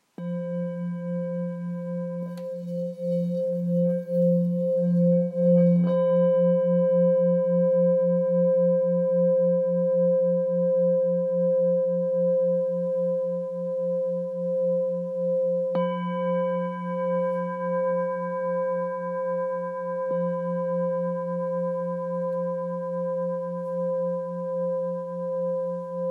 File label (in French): Bol bengale fa 1,4-1,5kg